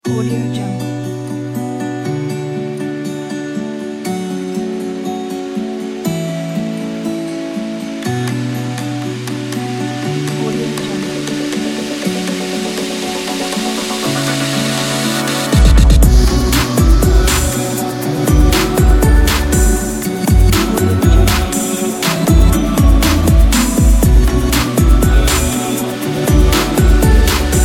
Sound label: inspiring technology